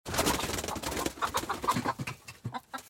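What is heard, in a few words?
wings of a hen and rooster, hen's voice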